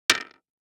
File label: DICE on Hard Wood, Drop, Standard, 5 Five Dice; Samples; ArticulatedSounds; DICE Sfx Party (Extended)